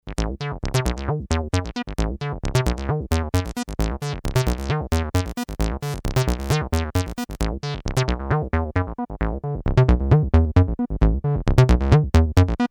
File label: Behringer TD-3 Pattern